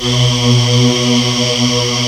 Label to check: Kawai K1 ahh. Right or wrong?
Right